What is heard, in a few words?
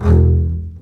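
basses E1 marcato short; East West - The Ultimate String Collection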